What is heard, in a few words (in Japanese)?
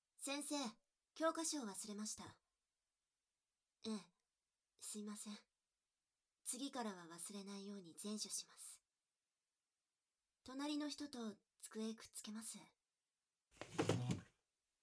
二人で左右から耳舐め; 性的にからかってくる隣の席の早乙女さん、と姫宮さん